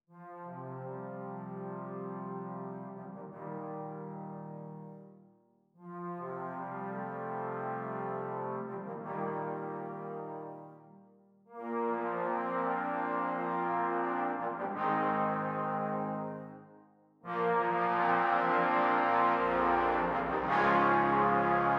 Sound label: Fanfare; Fanfare for the World